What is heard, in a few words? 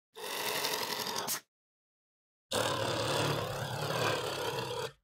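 medoed, honey badger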